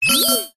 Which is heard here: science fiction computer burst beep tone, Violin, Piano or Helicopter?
science fiction computer burst beep tone